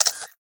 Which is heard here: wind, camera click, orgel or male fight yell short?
camera click